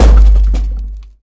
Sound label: Bass crash; friday the 13th killer puzzle